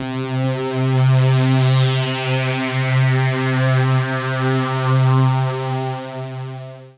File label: PAD, ATMO PADS; Best Service; XX Large